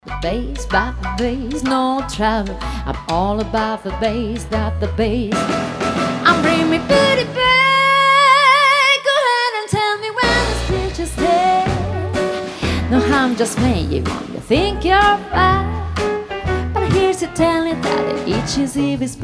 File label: ELECTRO SWING